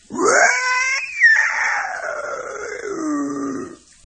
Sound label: Woarrrrrgggggummm